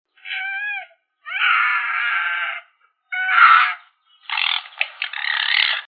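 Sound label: red fox, Vulpes vulpes, cubs; lisitsa obiknovennaya; lisyata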